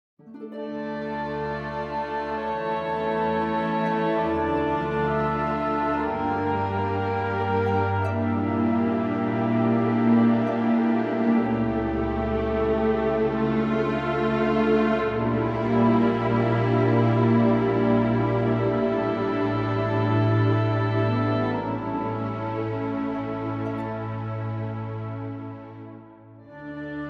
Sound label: sad, music; wesnoth